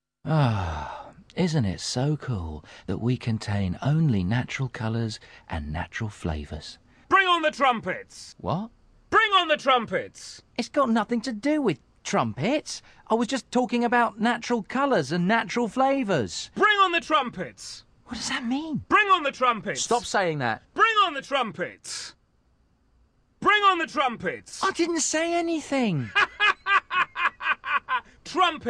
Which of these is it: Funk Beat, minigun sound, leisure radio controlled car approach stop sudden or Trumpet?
Trumpet